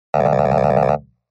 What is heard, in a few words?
iphone 3g grinder ringtone